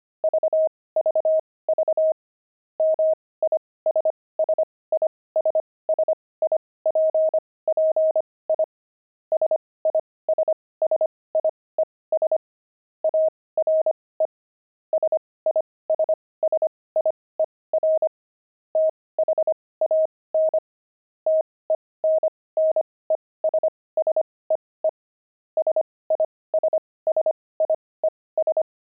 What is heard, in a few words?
pangram, intermediate pangrams files